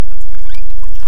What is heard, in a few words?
Inia short call